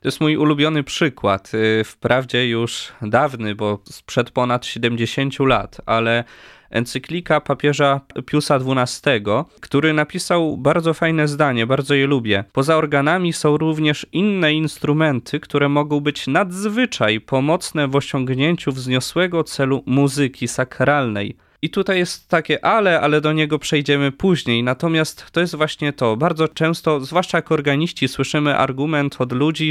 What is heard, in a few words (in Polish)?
organy